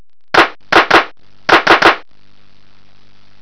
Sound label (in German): Schuss